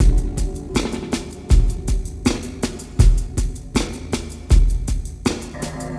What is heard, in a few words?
Ambi Groove